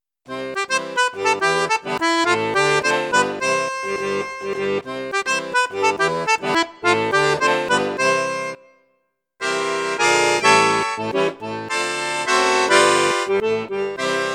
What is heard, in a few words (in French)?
registre flute juste piccolo, accordéon; cavagnolo virtuose 4